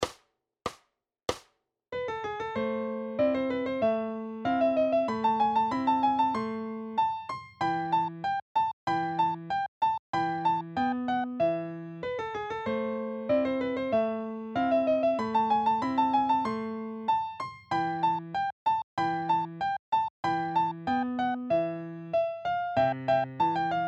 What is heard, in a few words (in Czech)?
Turecky pochod; Hity klasiky 2